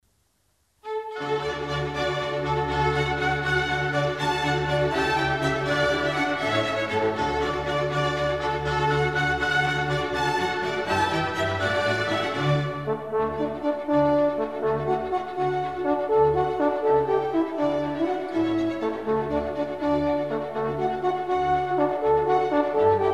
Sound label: Horn Concerto No.1 in D; Mozart Horn Concertos